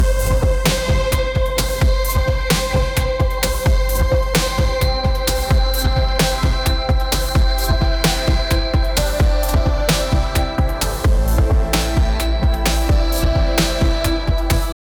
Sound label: sound for the intro of